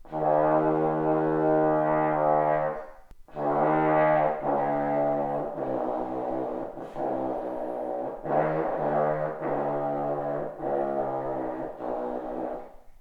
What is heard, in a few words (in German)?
pedalbass, parforcehornmusik